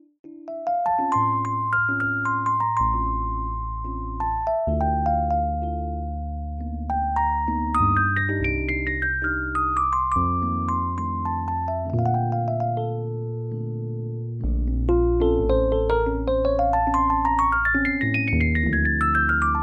Fender Rhodes Mark I; ElectriKeys; Syntheway

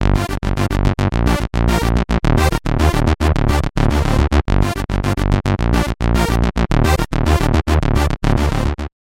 Moog Taurus Bass Pedal; Bassesland; Syntheway

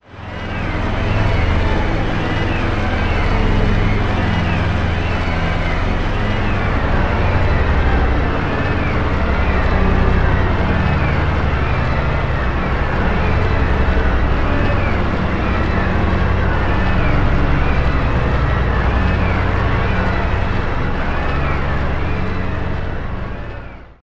Birds Sonic Sweep; Magnus Choir; Syntheway

